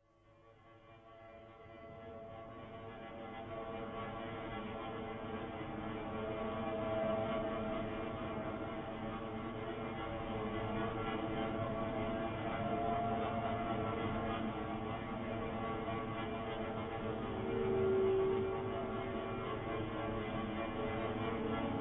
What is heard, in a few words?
Drones